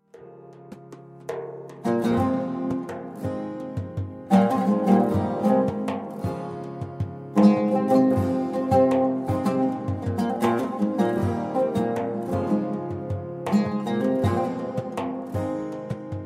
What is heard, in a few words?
Oud Cover